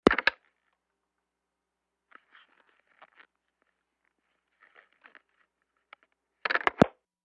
Telephone in-line pick - up, handling noise, hang - up; Futz Movement